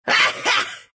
laugh, ru; dota2

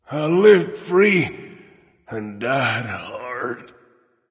Death; Beerchus; Smite

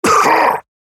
grunt hit; Paladins; Shaman

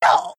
pain; meepo

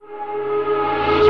Crystal Chorus Poof pre cast

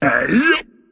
move pain; Dota 2; pudge

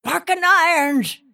attack pain; Dota 2; snapfire

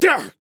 Grunt Attack; Viktor

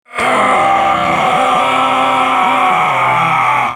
Falling; Makoa; Paladins

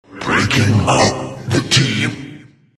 jak death; jakiro

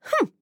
Mage Grunt Fast; Radon Flux; Realm Royale

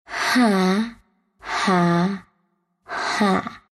laugh, ru; Dota 2

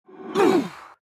Hunter Grunt Slow; Realm Royale

